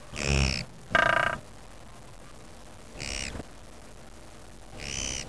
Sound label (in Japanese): kogera